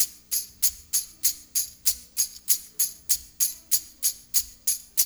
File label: TAMB; East West